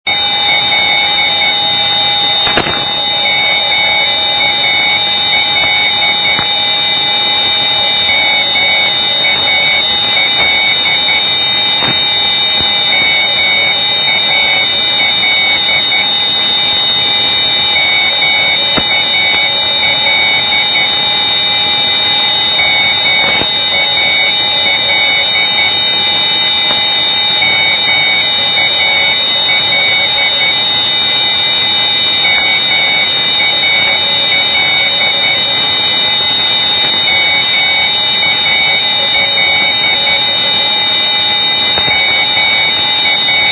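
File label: non-directional beacon; ELB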